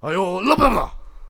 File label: Attack Vocals